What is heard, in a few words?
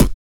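vox percussion, Vocal Planet Jazz FX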